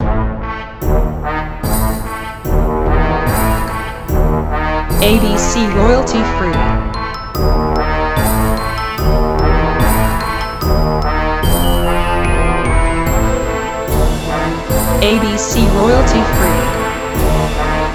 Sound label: Funny Scarry